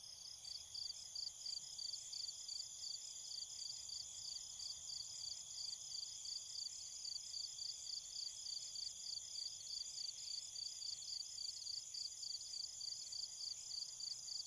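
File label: quiet sound of locusts in the distance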